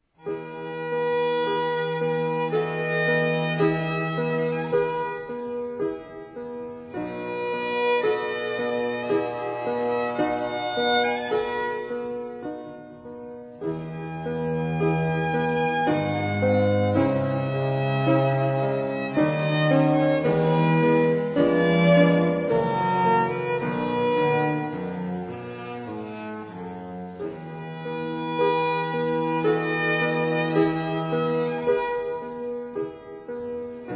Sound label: Piano Trio; Haydn, Piano Trio (Hob. XV:14), ii